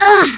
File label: fall; quake2; rei